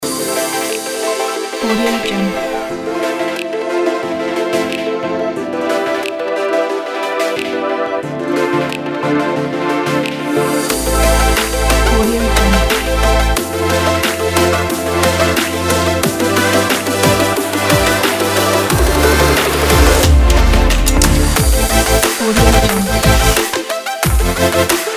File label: modern dubstep loop